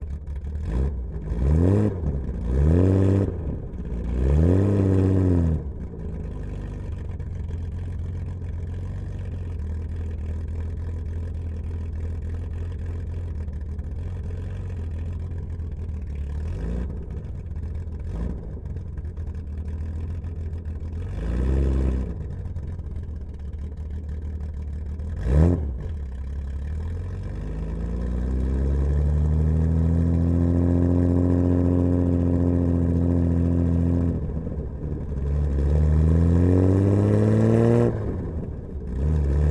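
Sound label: Datsun 240Z Onboard Slow Drive Various Off Exhaust Mix; Nissan; Fairlady 1973; Pole Position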